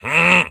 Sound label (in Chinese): 待机声; 卫道士